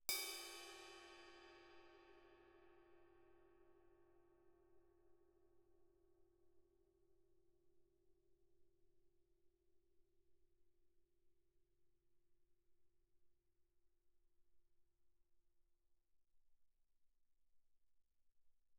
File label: crash stick normal mf, suspended cymbals; Percussion